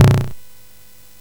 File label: CSG8580R5, GAMES; Street Rod